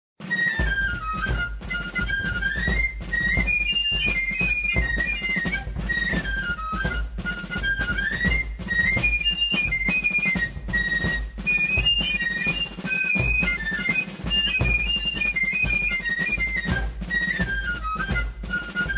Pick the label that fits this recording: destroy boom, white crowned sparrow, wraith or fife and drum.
fife and drum